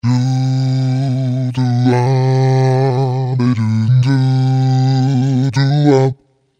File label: octave down